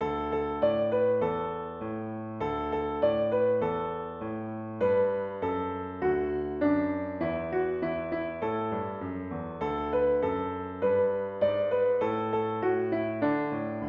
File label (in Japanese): ピアノ